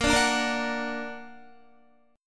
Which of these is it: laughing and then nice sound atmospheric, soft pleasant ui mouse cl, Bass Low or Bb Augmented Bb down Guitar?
Bb Augmented Bb down Guitar